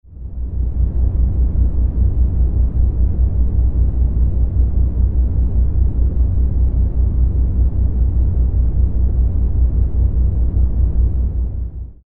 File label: Mid Frequency